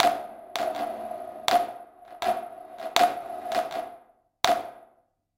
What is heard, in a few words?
Resclaps